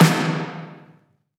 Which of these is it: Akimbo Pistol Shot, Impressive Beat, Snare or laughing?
Snare